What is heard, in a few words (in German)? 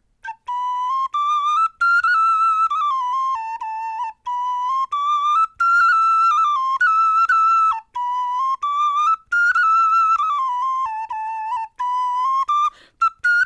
melodie